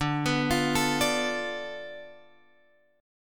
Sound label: D Minor 6th Guitar Drop D